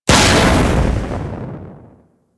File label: Weak Spot Explosion; Warframe; Sargas Ruk